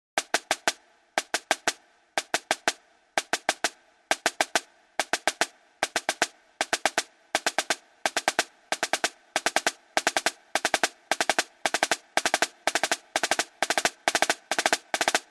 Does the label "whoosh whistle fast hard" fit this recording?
No